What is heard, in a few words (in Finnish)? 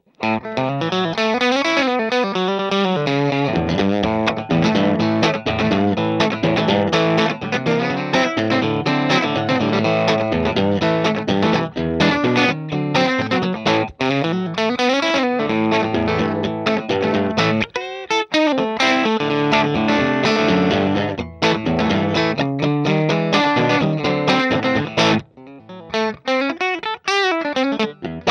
ecc81 6l6 tallamikki gain klo12; uraltonejr